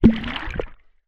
nature water splash small underwater object throw in; zapsplat